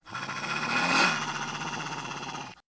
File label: wolf